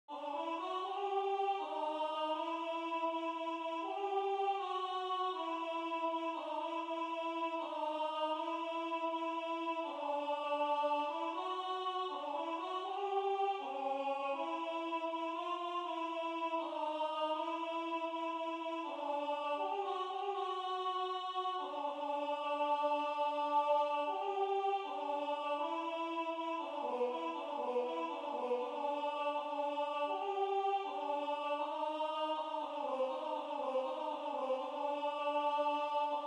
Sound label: Alto